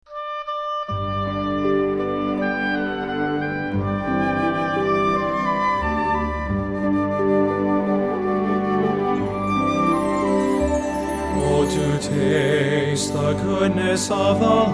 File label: Singers And Orchestra